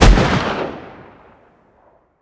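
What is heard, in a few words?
weapon pistol mag fire player atmo ext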